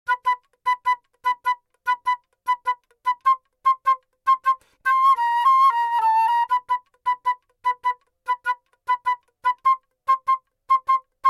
flute

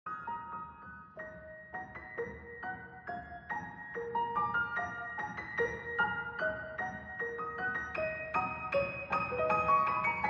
Italian Polka; S. Rachmaninov; V. Gryaznov.

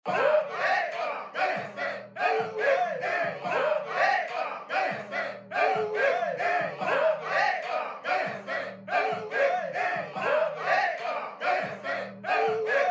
crowd

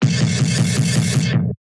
fill

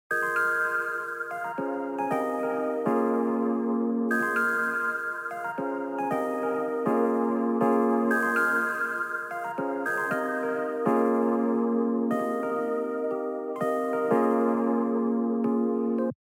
piano

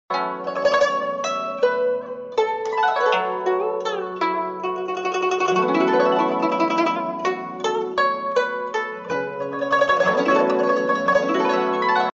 guzheng

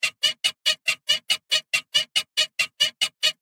bed squeak

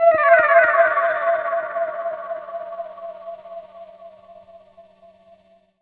Guitar Fx One Shot Emin

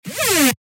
alarm

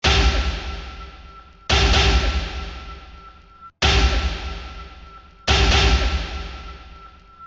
bass boom

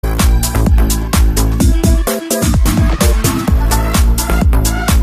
metronome

